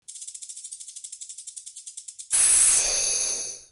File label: Snake Rattle and Hiss; Animals